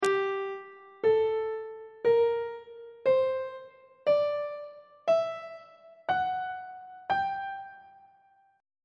a double flat melodic minor scale reverse descending on treble clef